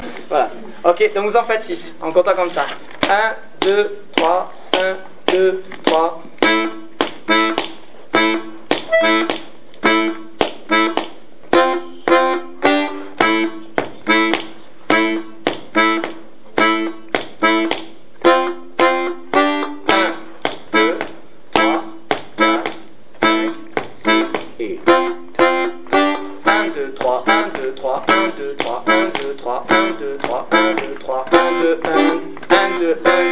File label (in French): rythmique diatos